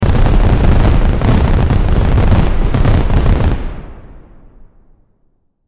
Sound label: Fireworks Long Burst; SFX Bible 6